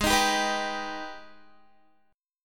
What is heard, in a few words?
Ab Minor Major 7th, down, Guitar; strummed; AbmM7